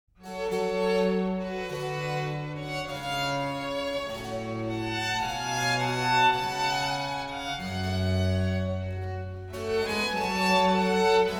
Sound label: Western